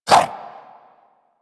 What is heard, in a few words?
Inf Throwing Star Whoosh, Infested